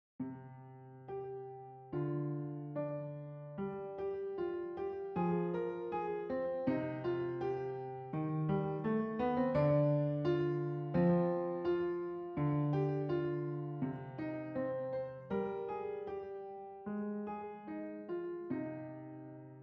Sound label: Piano